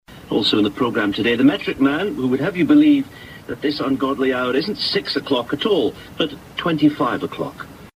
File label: bbc intro short